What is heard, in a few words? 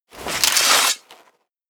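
blade one hand draw, equip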